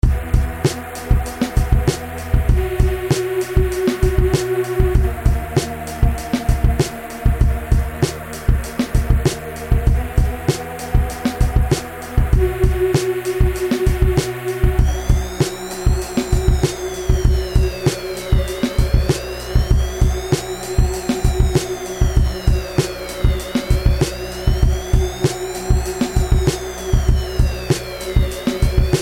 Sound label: groove hip hop